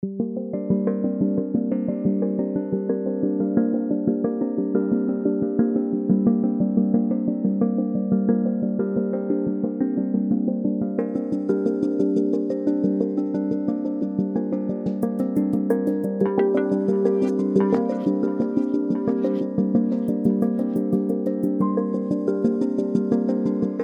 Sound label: Pulsing Skywalk